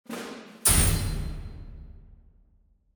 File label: sword fighter; pirates